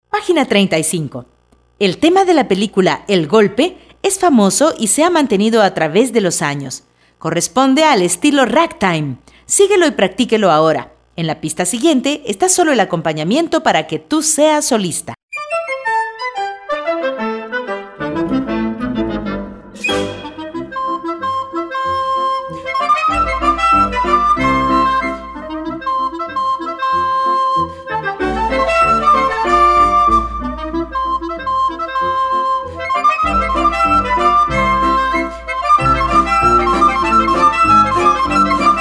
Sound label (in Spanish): EL GOLPE CON FLAUTA; El golpe (Frag.) - Scott Joplin